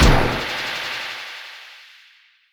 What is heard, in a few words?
shadow realm damage; weapons; dota; dark willow